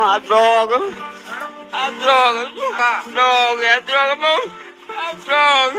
haaa

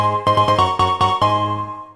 pokemon item received

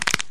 neck crack

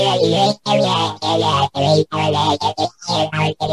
zeep glorp green alien cat meme

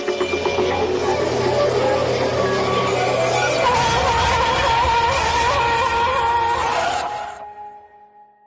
pollution noise; danganronpa

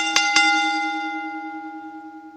ringbell; wwe

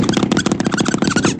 squeaky running feet

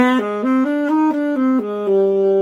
rift sax

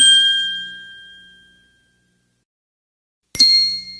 wink sound

